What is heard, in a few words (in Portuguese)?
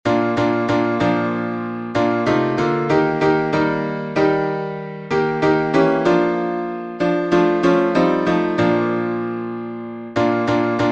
salmo playback